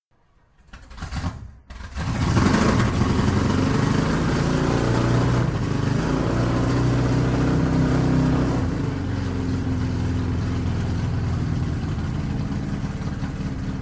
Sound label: packard374